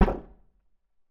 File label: Alien Weapon Shot; Military; Game Essentials